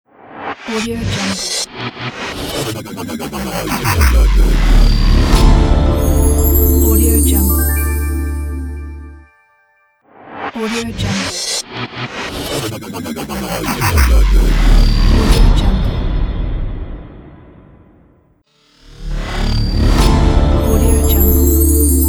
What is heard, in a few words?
scifi logo